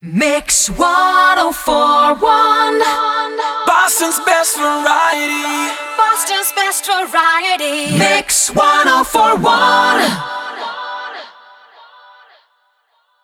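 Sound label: 104bpm MIX, jingle; WBMX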